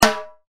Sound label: timbales